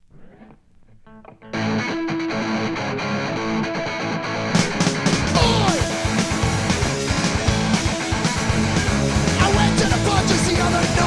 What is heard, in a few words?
Punk Rock